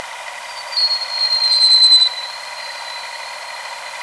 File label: Sonar